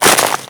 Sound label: Hammer Pull; Warframe; Grineer Hammer Boss; Foley